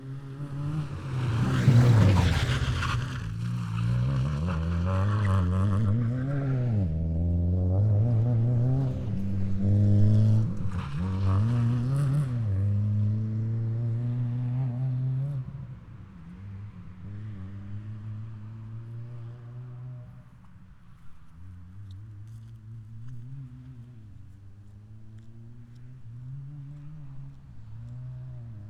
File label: track corner Fiat Seicento Rally Spec stereo; Race Track Corner; SoundHolder